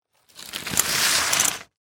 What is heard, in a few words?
Foley Metal Drag Through Dirt And Rocks Short